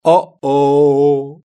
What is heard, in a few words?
incorrect; multipleChoice